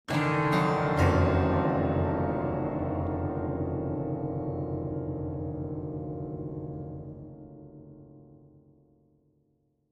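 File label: Grand Piano Strings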